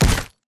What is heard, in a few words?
fight knockback winner; Titanfall 2